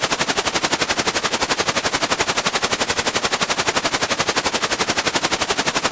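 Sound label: helicopter, FM synth; AKAI S-Series CD-ROM Sound Library VOL-6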